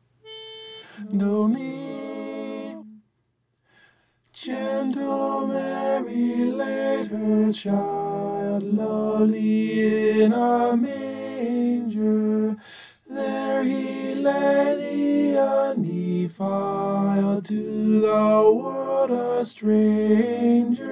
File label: gentle mary tenor